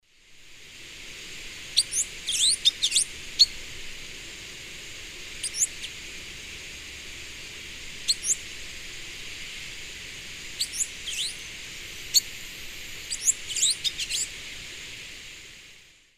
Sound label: Ramphocelus carbo, calls and subsong; S. America; Eastern Lowlands